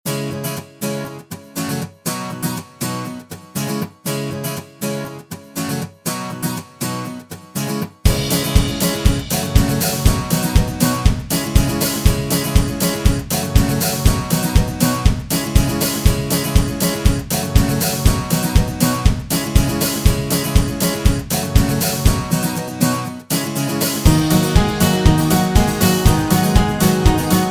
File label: Positive Uplifting Motivational Background